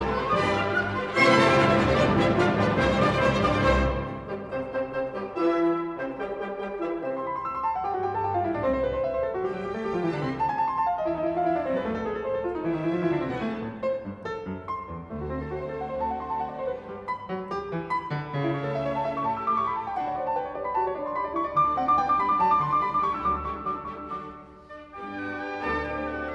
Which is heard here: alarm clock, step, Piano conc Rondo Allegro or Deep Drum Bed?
Piano conc Rondo Allegro